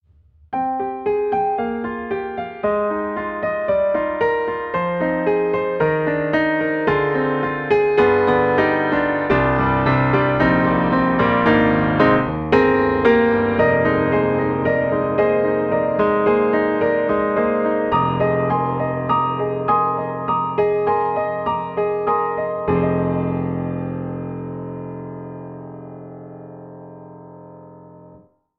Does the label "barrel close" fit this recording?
No